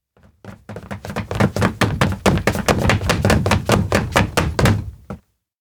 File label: steps indoor new hall run; rikka; anemoi パッケージ版 1.1